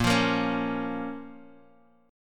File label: Bb Minor Bb down Guitar Open D